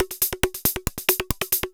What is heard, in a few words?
percussion loops, Techno; PS-45 WAV Techno ID